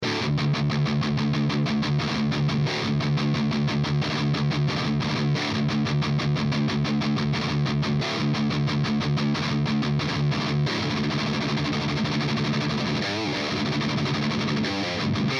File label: Fluence Modern V2 Rectifier; Fishman